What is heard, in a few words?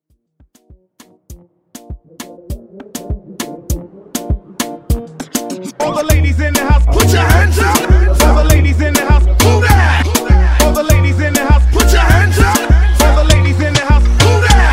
Moombah Hype; Moombah Hype Edit v2